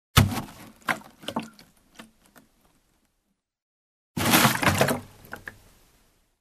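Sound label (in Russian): роняют полную пластиковую канистру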